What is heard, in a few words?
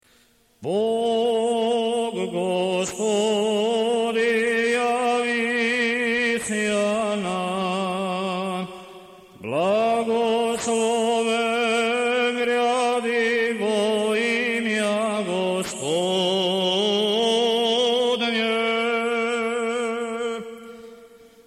Osmoglasnik II glas